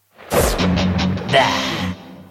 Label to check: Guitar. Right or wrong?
Right